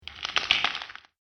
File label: Bone Break, injury; combat